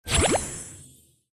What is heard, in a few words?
generate tile; tekton